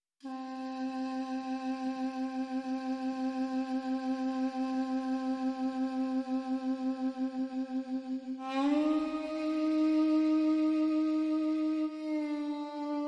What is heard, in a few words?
Melodies Only